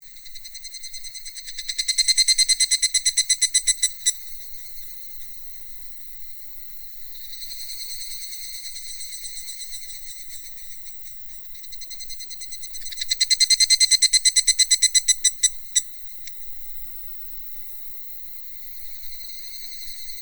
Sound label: cicadas